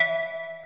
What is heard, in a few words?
Arp; osu